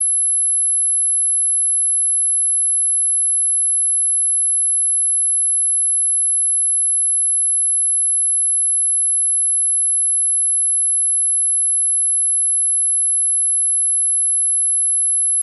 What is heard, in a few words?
Silent Dog Whistle